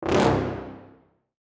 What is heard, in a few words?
short and fat brass